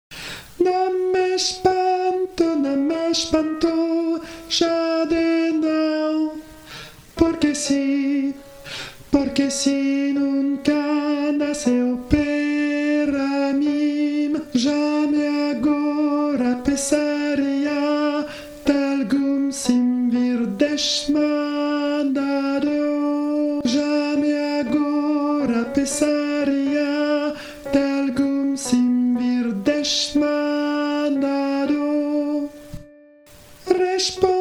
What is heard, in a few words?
alto; nam m espanto